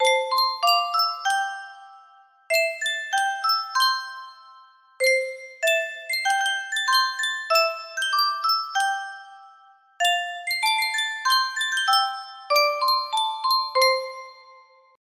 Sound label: music box china melody music box, music box melody